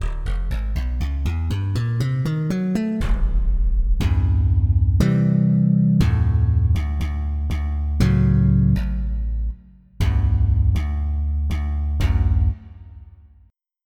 Funk Pop; Onj's Soundfont

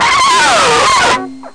plec scrape, guitar; OctaMED SoundStudio V1

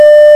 FEEDBACK

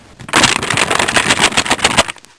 Paper Rustle

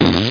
fart

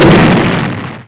Critical Stop; Wormageddon

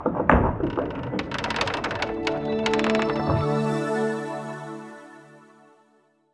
Open Box; MANALINK; DuelSounds

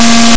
EMU CAMEO, DRUMS; AVALON2

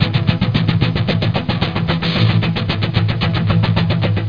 rock intro

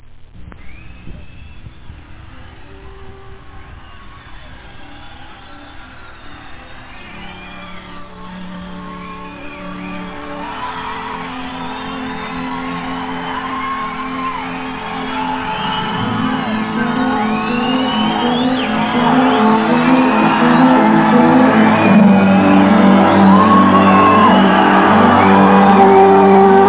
metalic

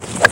divot; Jack

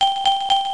Ding

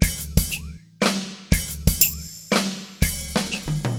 drum; FUNKIN&GROOVIN'

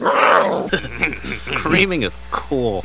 ogre pain; butthead

